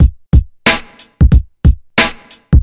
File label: 91 bpm breakbeat g key; drum loop